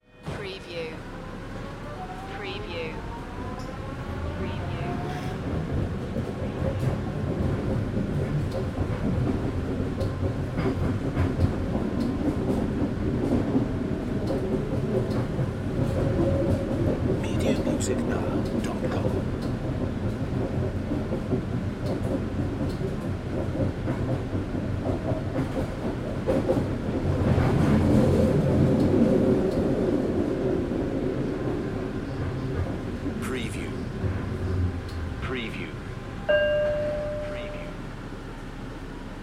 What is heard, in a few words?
Elevated Train Int; sound-effects-bible